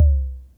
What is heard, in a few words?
SYNCUSSION